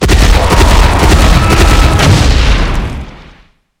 dragon rush, dragons; combat; Cosmic Rage

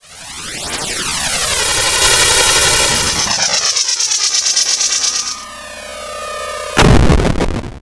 logo; Cosmic Rage; The Experience-light

